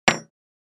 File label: Dropping a ring on a wood table